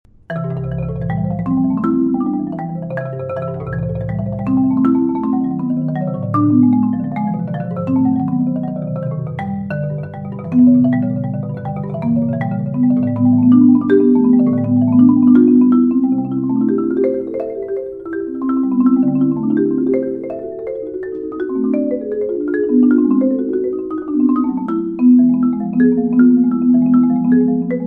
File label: C Major Etude